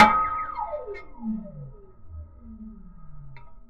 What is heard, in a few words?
Snare, drums; Ableton Live 12 Lite Core Library